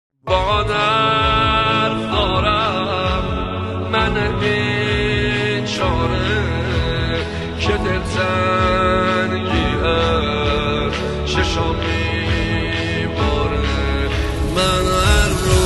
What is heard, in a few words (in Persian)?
مداحی احساسی